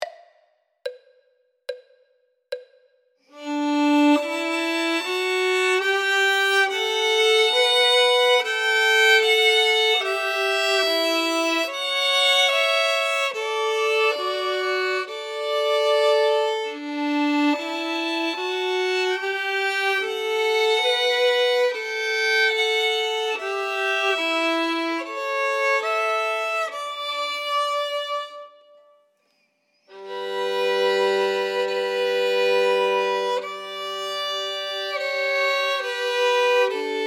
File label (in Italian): violino I e II